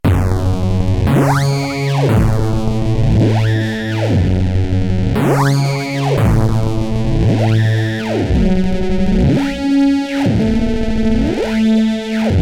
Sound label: synth harsh, access virus